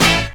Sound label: HORNHIT; E-Lab; X Static Goldmine 2; akai